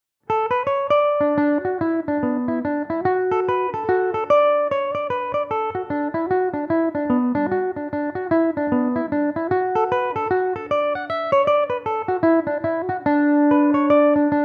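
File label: D major belmuse, irish mandolin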